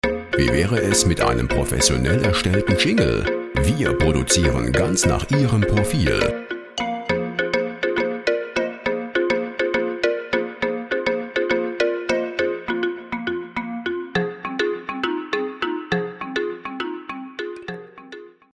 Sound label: marimba